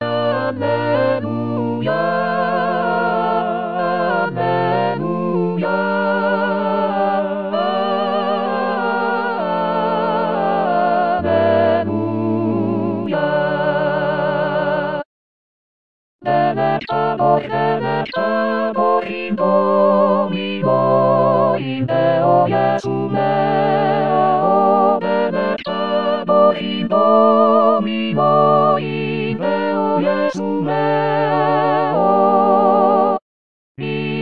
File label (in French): Choeur